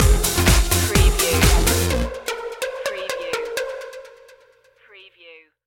sexy step stinger

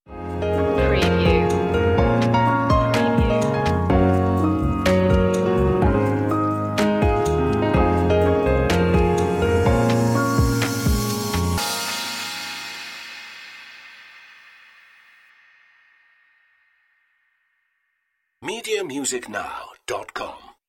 thinking no guitar; media music now

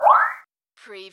Button Sound - Metal Saw Bend

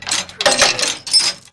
Chain Metal Clanging

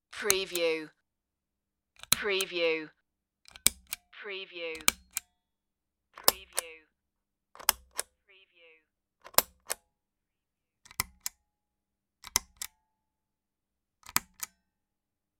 metal switch

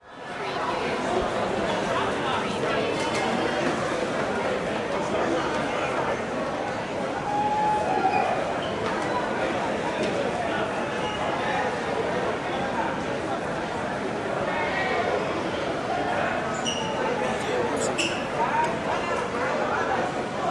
Mall Crowd Walla

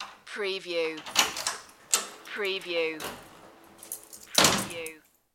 apartment door unlock

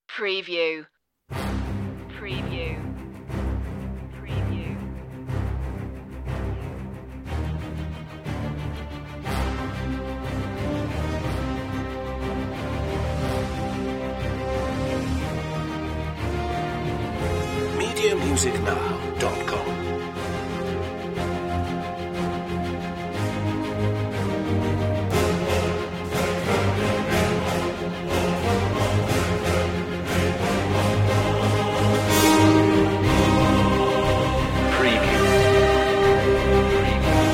choir no perc solo voc

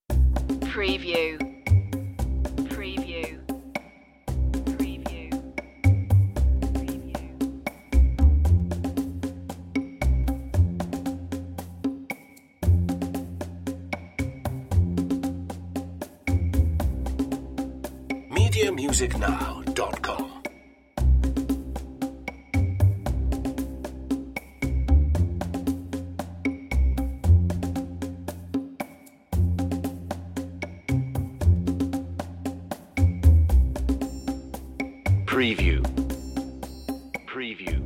bass and perc only

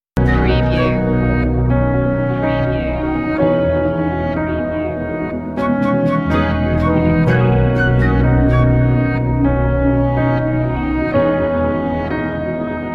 deep technology loop

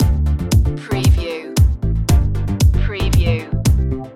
house chilling loop

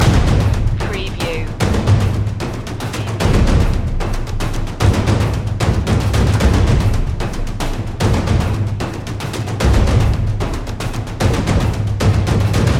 dramatic percussion loop

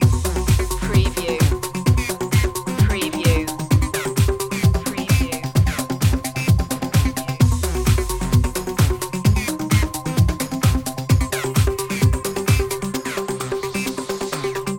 groove loop

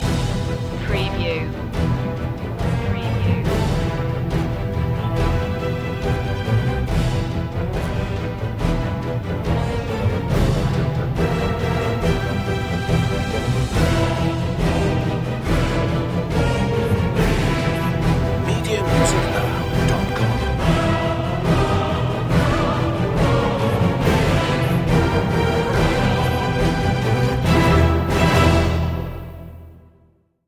no solo vocal

